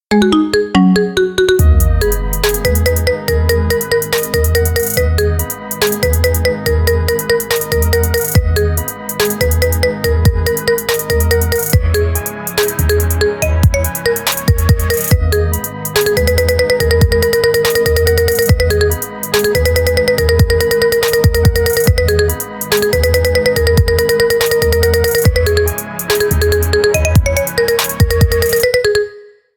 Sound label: marimba remix; ringtone